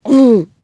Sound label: ehgulp